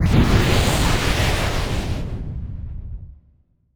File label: wind magic middle; 魔法/法术的音效